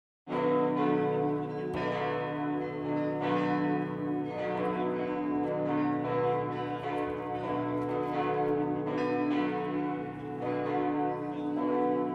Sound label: church bells